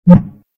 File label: Keypress Standard; Alcatel Vodafone Smart II